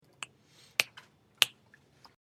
finger snapping

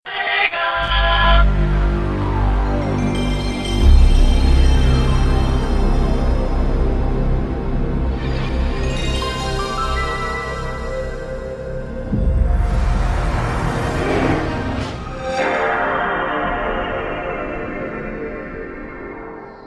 console intros

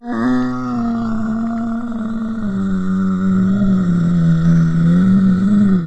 zombie attack walk

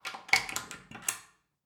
Door Lock Key

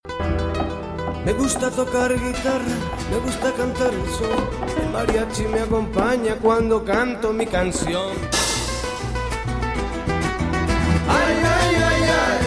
ringtones mariachi

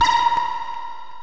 scifi

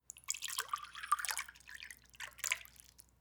Pouring Water

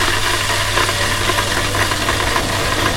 noise

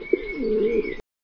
pigeon coo

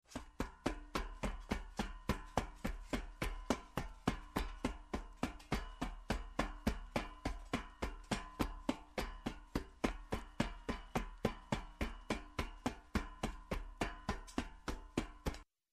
footsteps metal fast

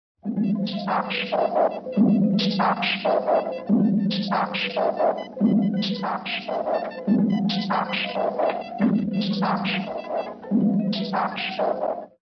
CARTOON MACHINE

